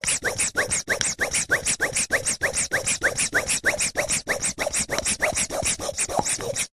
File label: household balloon pump pumping action fast valve pov